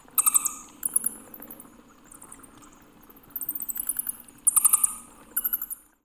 amb animals bat squeak

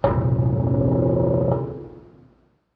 nuclear pump; nuke

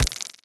step layer, headcrab black movement

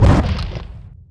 strider step; npc; Half Life 2; Episode 2

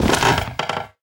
fs fm plywood, footsteps; player; Portal 2

